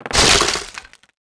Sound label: wood fence common; World of Tanks Blitz